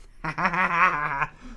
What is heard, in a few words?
Gremlin Laugh